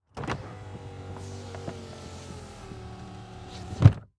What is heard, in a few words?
Auto Saturn Close Window Interior; Transportation